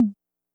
Percs

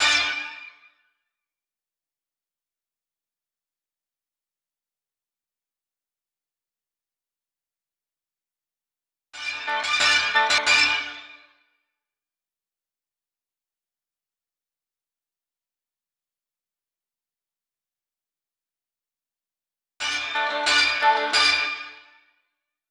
impact fx loop bounce 90

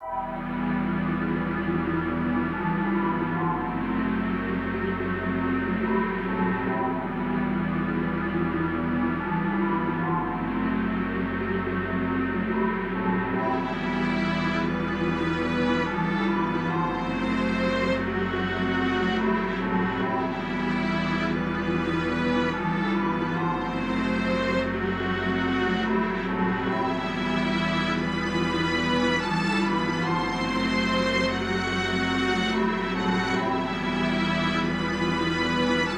144BPM, E Harmonic Minor; space orchestra

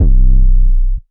Astro Roundabout 808 - C#; Cymatics; 808s

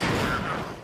Slams Weird Dude Through Door; Edward

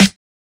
Snares

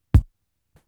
KICK

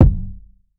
Slump Kick; Kicks

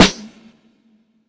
Snares

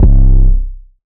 808 C

